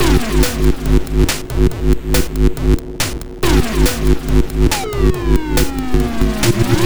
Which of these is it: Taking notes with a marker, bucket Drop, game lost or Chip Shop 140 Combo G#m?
Chip Shop 140 Combo G#m